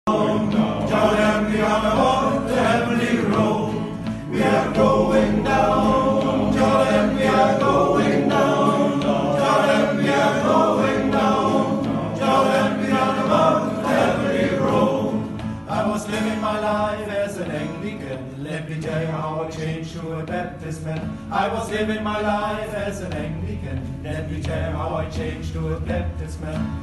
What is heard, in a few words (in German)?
Chor